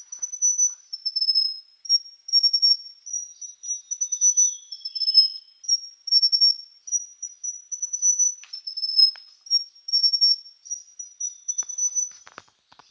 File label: Spotted Elachura Elachura formosa